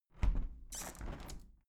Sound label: window plastic squeak close soft sequence